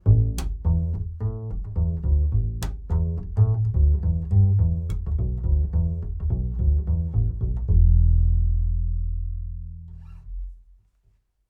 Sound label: DPA 4099 Upright Bass